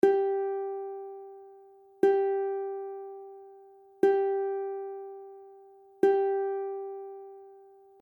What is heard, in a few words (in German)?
ukulele stimmton g